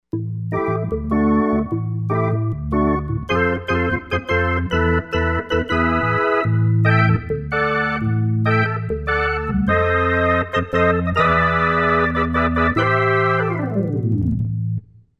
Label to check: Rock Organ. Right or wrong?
Right